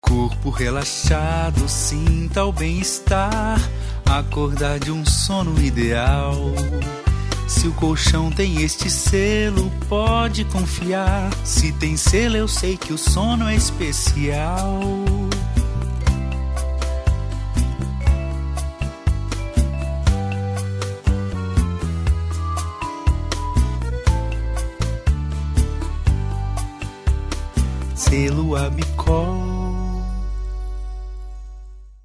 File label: jingle; ABICOL